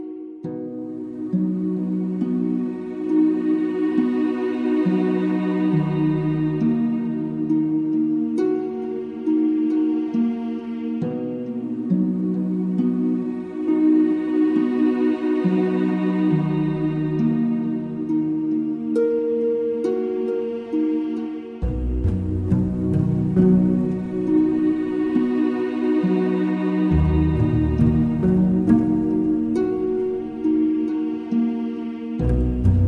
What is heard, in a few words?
No Vocal Loop